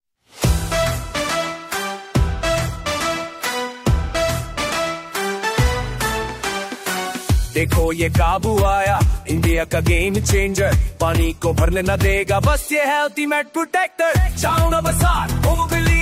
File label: POP Jingle; KABU POP Radio Jingle